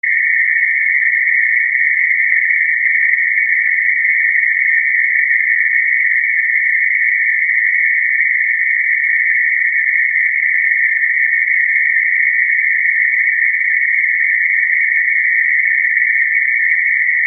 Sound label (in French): bruit rose